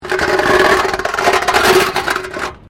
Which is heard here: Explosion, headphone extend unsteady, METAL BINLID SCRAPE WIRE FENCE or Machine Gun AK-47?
METAL BINLID SCRAPE WIRE FENCE